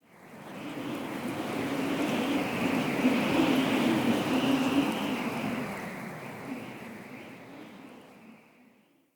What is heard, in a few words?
Under City Wind Gust Strong Whistle Rope; Ambience